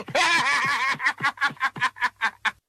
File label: laugh; skeletor